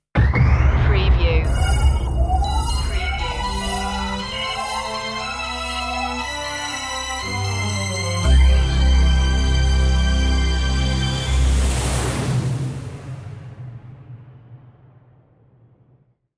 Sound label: audio logo